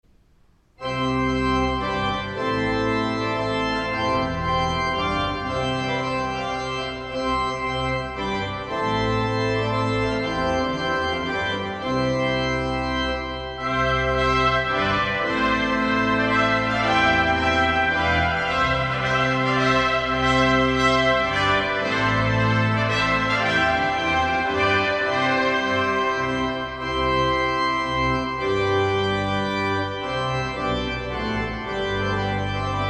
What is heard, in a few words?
Prelude, Trumpet Tune Duet; St. John's Cathedral, Jacksonville Florida; Jeremiah Clarke